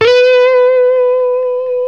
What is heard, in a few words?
LES PAUL LD, Guitars; Spectrasonics; Hans Zimmer Guitars VOL-1